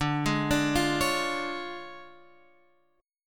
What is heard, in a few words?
D Minor Major 7th Flat 5th D down Guitar Open F; DmM7b5 arpeggiated; JGuitar